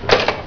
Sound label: PHONE, 电话; 特酷声音素材库